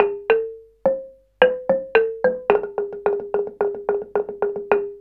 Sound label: KULINTANG